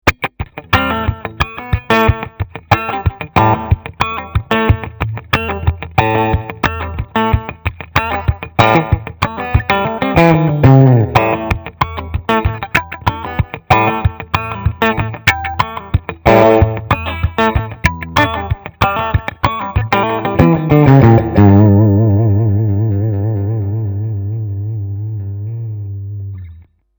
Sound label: Pentan Pick